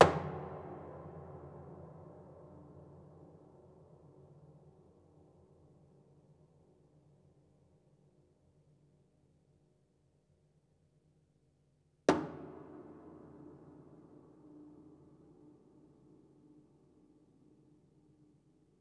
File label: Body Thump Ped Sparse, Piano Destruction